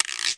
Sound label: Roland R-8 Perc